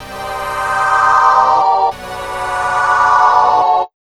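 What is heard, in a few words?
chords, Electro 125; Big Beat Drumloops; USB Soundscan vol.25; AKAI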